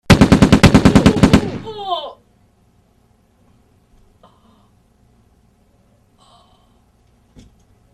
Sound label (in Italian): raffica